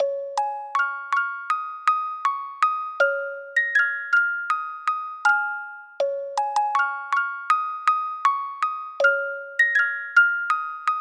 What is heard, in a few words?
Song (Music Box); animal crossing new leaf welcome aamiibo soundtrack